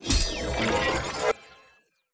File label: jingle Combat Enter; maliki poison of the past switch windows gamerip 2025